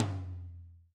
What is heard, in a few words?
Lo Flat Rm, Alesis SR16